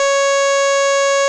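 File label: OB8 organ F#3, OBERHEIM OB8; Big Fish Audio - First Call Vintage Keyboards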